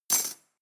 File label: cutlery teaspoon drawer place hard